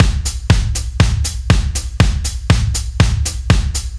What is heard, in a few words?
Heavy Groove A, rock